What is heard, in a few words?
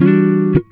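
guitar chord funk; Univers Sons; Jazzistic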